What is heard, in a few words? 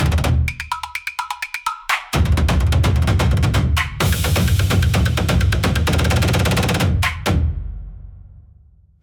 taiko no bamen tenkan long, free jingles